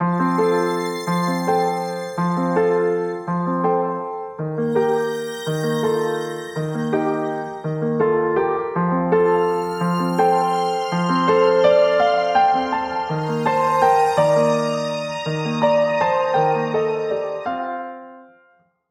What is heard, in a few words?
Rest Outro, A loop I created for an endless runner